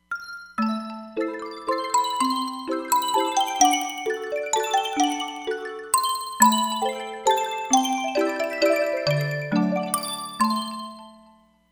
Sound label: Toy Box, Roland Fantom XR